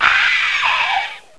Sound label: scream